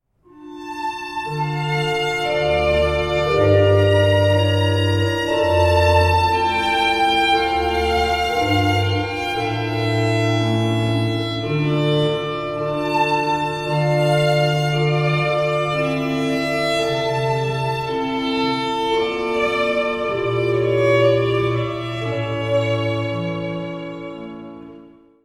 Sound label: Sarabanda d moll; Corelli